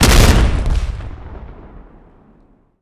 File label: explosion, grenades; Frag